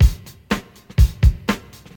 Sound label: 123 bpm drum beat b key